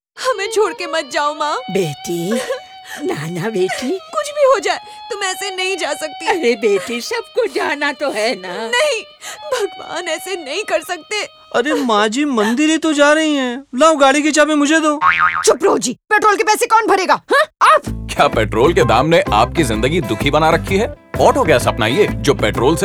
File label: Jingle